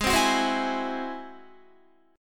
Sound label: Ab Augmented 7th Ab down Guitar; strummed; Ab+7; JGuitar